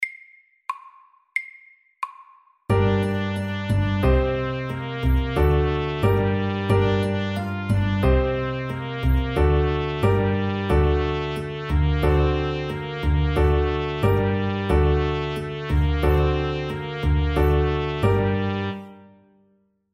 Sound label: MEDIUM 90